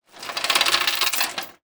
industrial chain med drop release over metal bar or edge; zapsplat